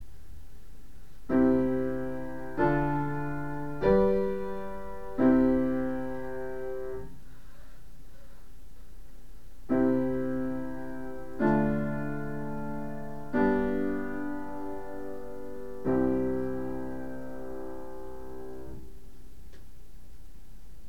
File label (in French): cello quintes